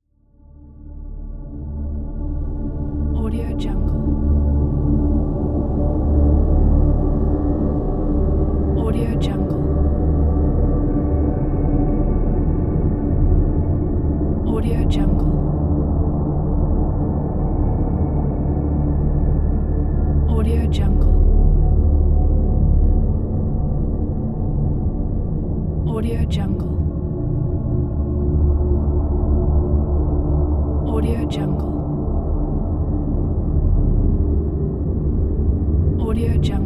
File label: drone